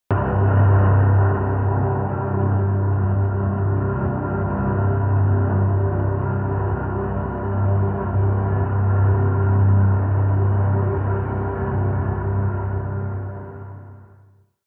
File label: industrial soundscape; musikelemente; drones